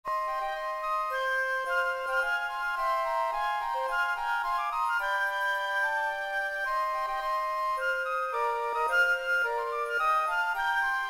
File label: Flutes; Hymn